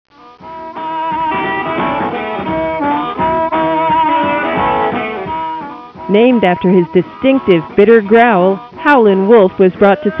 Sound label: wolf howl